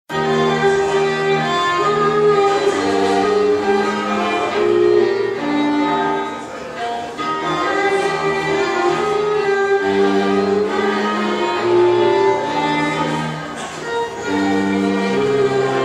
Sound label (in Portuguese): QUARTETO DE CORDAS; WACHET AUF